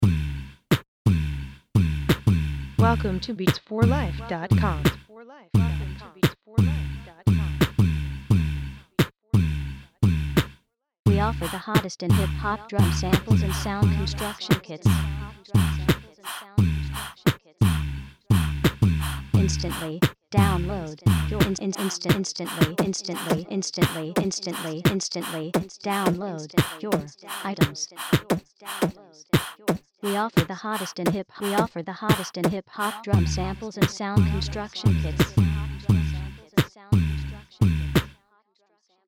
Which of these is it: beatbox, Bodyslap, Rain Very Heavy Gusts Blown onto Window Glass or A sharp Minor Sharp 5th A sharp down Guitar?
beatbox